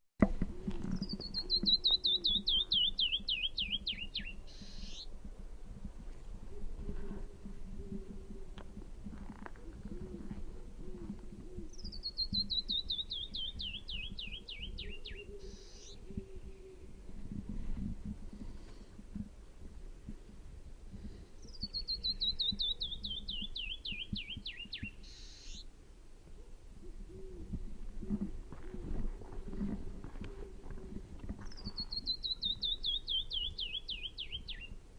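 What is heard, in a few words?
Canyon Wren song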